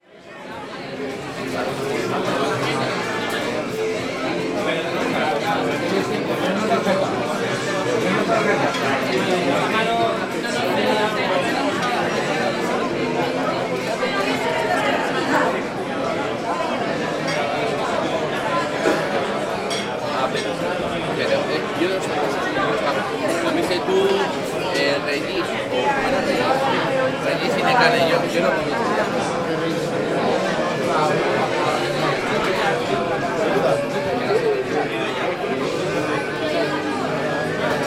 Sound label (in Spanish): ruido ambiente; bilbao; sorginzulo; soundfood